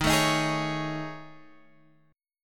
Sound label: A Major 7th Flat 5th D sharp